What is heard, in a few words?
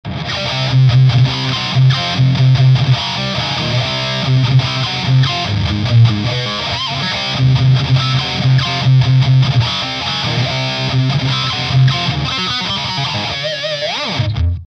SM7 Void Smooth, Ibanez Guitar Effects Pedals